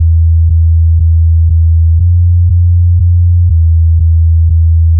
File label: ship horn